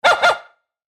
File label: dog